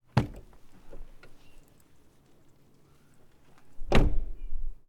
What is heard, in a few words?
Doors